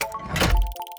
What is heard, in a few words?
security door payment counter; Counter Strike Model Pack; CS:GO; survival